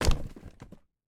Box First Fall; MiSide

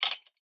Tool; Game rip; MiSide

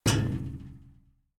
Flash Hand; Game rip; MiSide

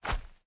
Step Grass; Game rip; MiSide

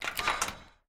Oven Open; Game rip; MiSide

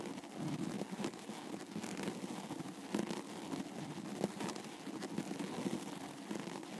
Head Move; Game rip; MiSide

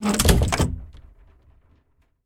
Wardrobe Close; raw game audio files; Mita; MiSide

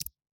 Enter Table; MiSide

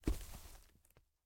Ladder Up; Game rip; MiSide

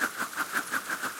Gun Whip; MiSide

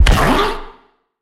Spider Put; MiSide

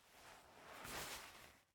Kick; Mila; MiSide

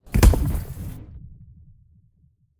Wire Fall; MiSide

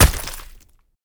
Monster Hit; Game rip; MiSide

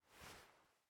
Keep Glasses; game audio; Mita; MiSide

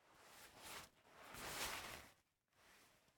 Player Move Door; MiSide

